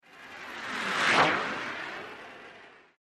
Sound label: Blow Torch By At Medium Speed, With Lots Of Air Ripping